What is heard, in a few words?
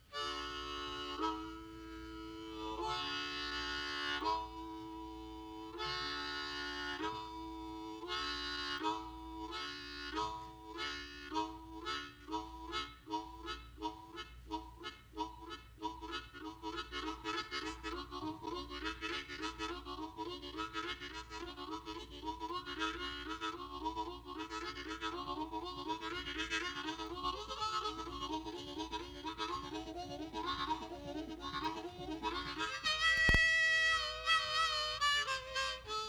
Train Harmonica Song